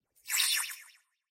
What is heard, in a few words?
Anime Punch Synth Swirl